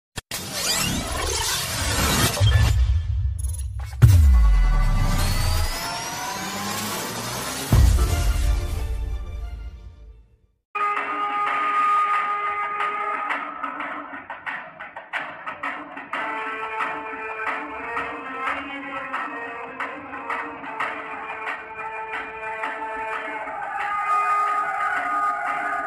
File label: BGM Music; Rangasthalam